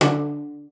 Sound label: CELLO FINGER D3; Xsample VOL-5